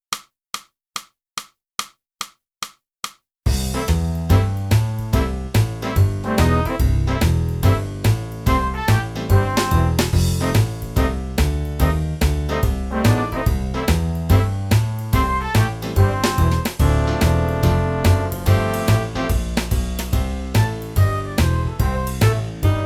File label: clarinet